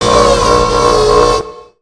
Robot Stunned; creatures mechanical; Lego

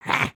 Run; Lego Strombie; creatures organic

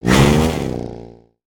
Space Marauder Swing; Valiant; aronwk collection; ndaudio

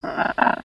Bird Amazon One shots; Lego; ambience

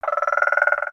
Pets Tortoise Happy; creatures; Lego

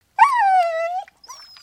Pet Doberman Whine; creatures pets

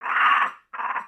Pets Skunk Idle Bored; creatures pets; Lego

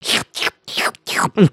drink

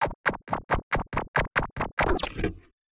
Pet Cat Beg; creatures pets

